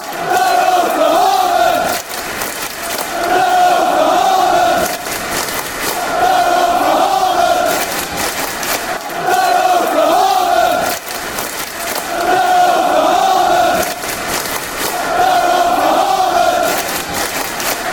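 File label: fanchants, football chants; darryl flahavan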